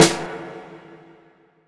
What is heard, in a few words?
snare mixed mirror